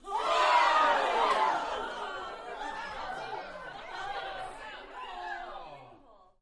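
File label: Crowd 15 Interior Rowdy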